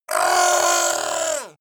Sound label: fishman shout pain hit